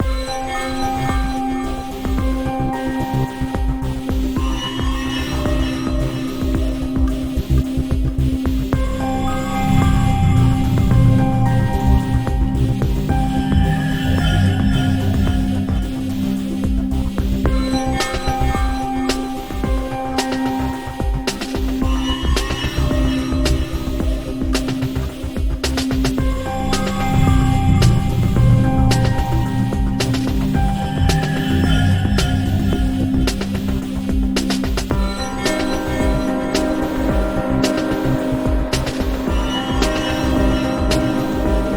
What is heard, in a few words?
BGM Reasoning